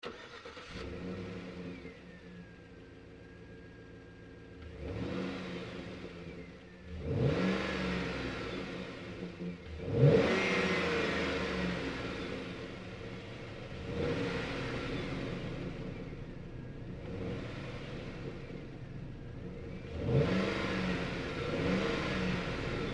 Truck GMC Savana Onboard Start Revs Off Lite; 2010 GMC Savana; Trucks; Big Room Sound

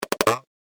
Cartoon Boing; Andrew Fleming

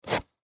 Tissue Box Remove, Tissue Box Remove Single Piece; Bathroom; Foley

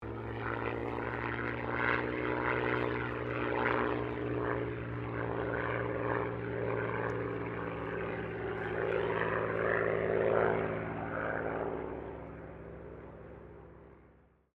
AIRCRAFT SUPERMARINE SPITFIRE MK-XVI PASS DISTANT; Airplanes; Vehicles; Airplane Sound Effects

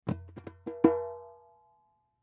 Goombay Drum Rhythm; Roll Short; Drums; Blastwave

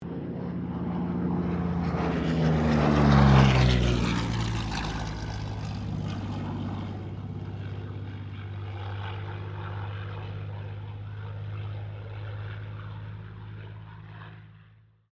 AIRCRAFT P-40 KITTYHAWK PASS STEREO

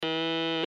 Morse Code T Letter; Blastwave

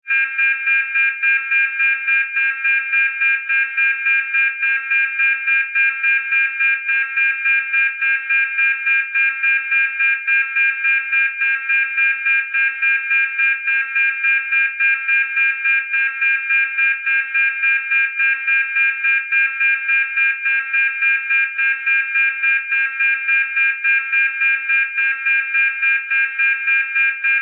Science Fiction Space Station Alarm; Spacecrafts

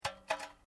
Metal Light Movement Minor

